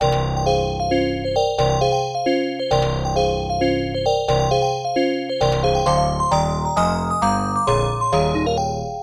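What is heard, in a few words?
background music; Strider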